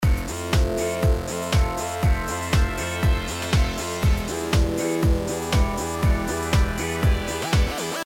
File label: synth recipe